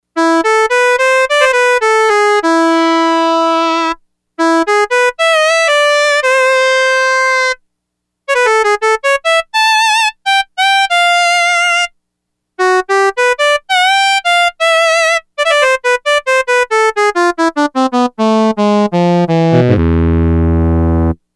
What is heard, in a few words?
Lyrisyn, Yamaha DX100; Factory Patches